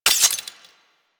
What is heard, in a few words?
Quill Dart Gun Ice Mech; Warframe